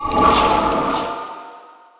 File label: mana void cast; weapons; persona; dota; antimage